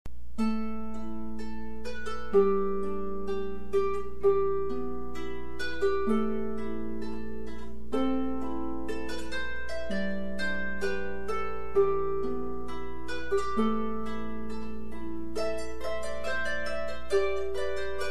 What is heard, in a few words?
double harp; lo yisa goy